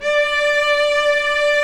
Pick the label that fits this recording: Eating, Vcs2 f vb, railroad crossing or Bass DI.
Vcs2 f vb